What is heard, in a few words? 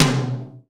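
tom room, drums; East West - Global Sounds; RY30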